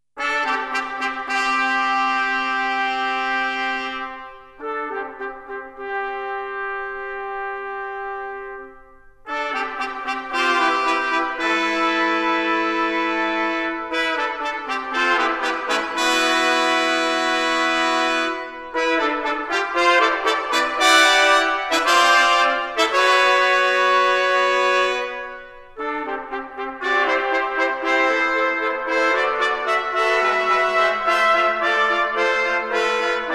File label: Fanfare; Nemo